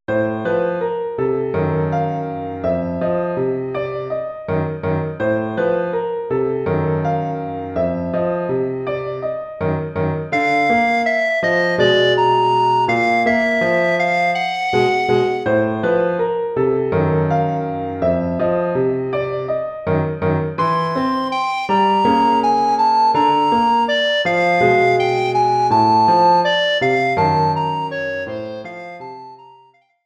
Tattered Rag, This alto recorder and piano duo is pretty challenging indeed. A starting time signature of 7/8; combined with classic syncopated rag rhythms, produces an interesting off-ba; American Recorder Society